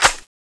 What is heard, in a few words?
clip in; tfa cso; norinco 86s